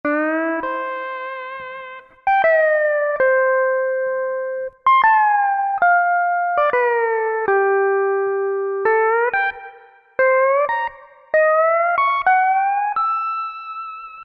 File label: PDLSTL LICKS, American Heartland; Sonic Reality American Heartland